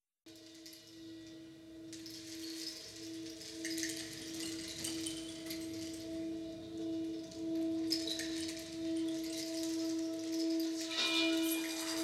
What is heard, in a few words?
Native American Chant